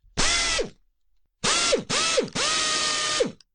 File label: Rewiring Loop; The Avali's Audio Overhaul